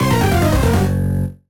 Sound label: Excavation Failure